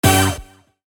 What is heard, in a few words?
Slot machine casino reel spin symbol hit